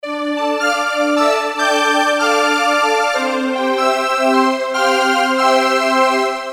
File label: Tension